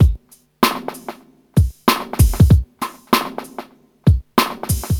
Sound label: 96 bpm drum beat d sharp key, Free breakbeat - kick tuned to the D# note.